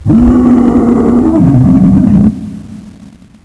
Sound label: idle, zombie; zm mod